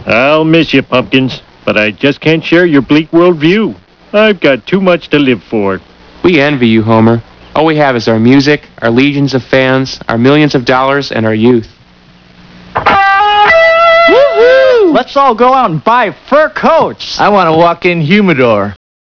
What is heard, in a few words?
woohoo